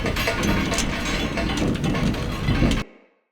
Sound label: Pollution Tower Furnace Door Loop